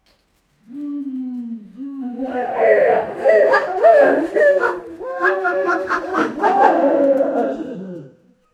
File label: small group of prehistori, prehistoric